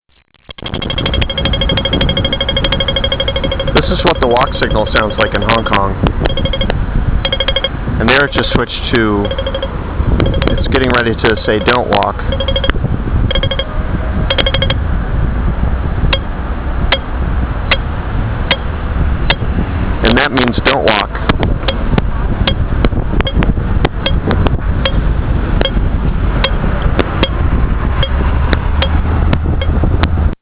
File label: hong kong walk signal